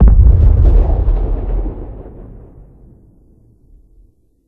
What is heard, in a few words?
Explosion Car Nuke Dist, War Ambience